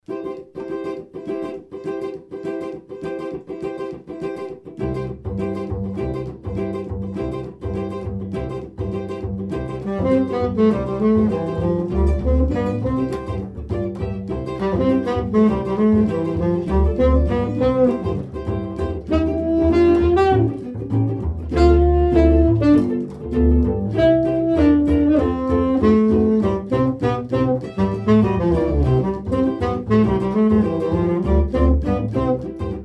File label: Jazz Trio; Netherlands Jazz Trio